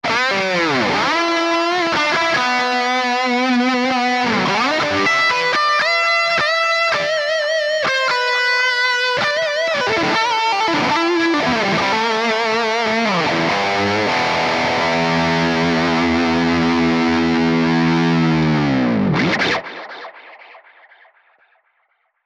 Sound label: Mesa Boogie Satisfying Lead; Neural DSP